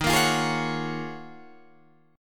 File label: Eb Minor 11th Eb down Guitar Drop D; Ebm11 strummed